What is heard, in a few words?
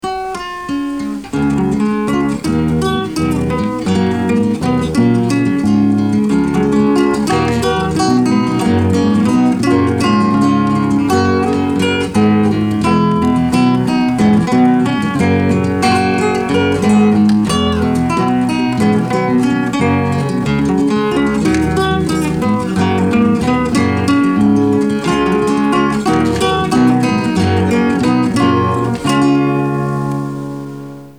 acoustic